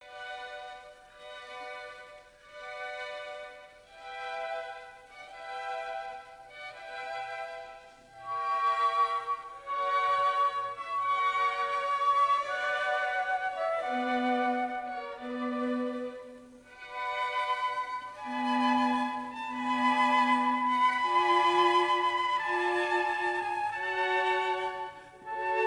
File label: Orchestral Suite No.1 in C Major; BWV 1066 VI. Bourrees